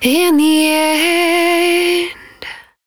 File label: 110bpm Ab, Velvet Vocal; Zero-G